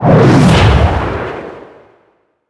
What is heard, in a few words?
revive gun revive out, weapons